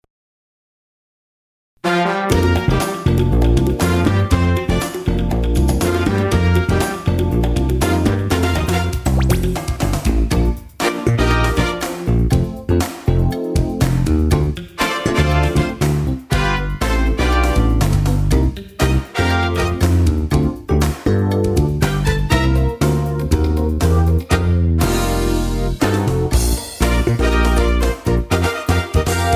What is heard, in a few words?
game show track upbeat music